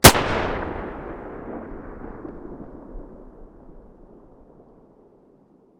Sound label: beretta muzzle mono distant, weapons